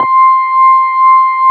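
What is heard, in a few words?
Wurlitzer C5 - R; USB Soundscan vol.09; AKAI; Keyboards Old School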